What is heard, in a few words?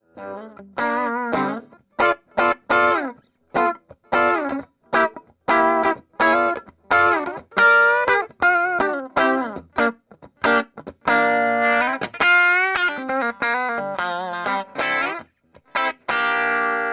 p90 to off; cav lion champster2